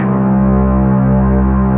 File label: FILM SCORE C; Greytsounds; Scott Peers Sound Engineering